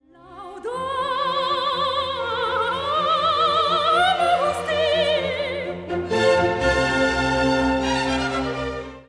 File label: Laudamus; K427